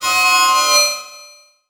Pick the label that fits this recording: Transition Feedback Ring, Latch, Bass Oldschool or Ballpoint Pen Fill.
Transition Feedback Ring